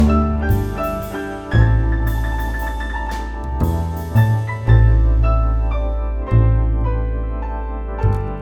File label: Galaxy S7 Ringtone